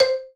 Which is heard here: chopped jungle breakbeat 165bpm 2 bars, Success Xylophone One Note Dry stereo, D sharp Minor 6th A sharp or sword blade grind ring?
Success Xylophone One Note Dry stereo